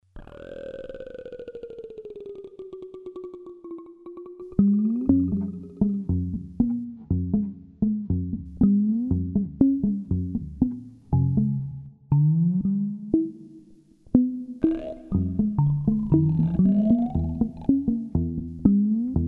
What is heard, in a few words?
Mild steel